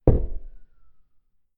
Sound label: Deep Bass Thump